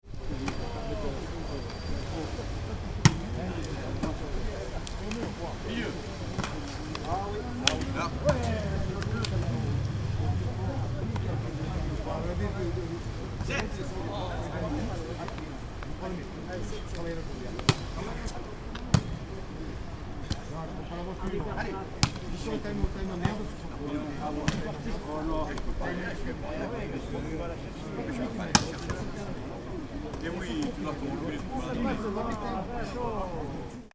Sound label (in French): la partie de volley; Marseille